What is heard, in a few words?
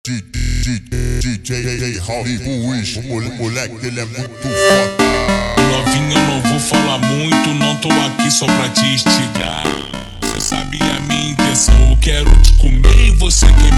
super Slowed, funk